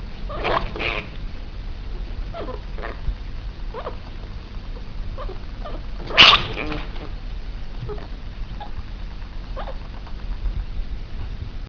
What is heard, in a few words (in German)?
knurren, chinchilla